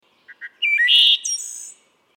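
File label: phrase, wood thrush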